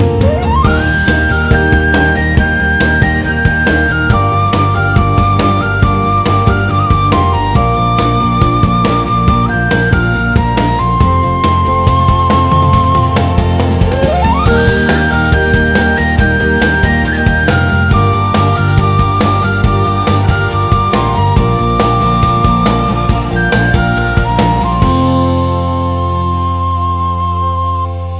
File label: indian melody ringtone